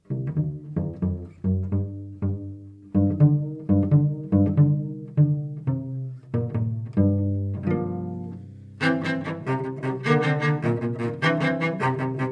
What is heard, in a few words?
blues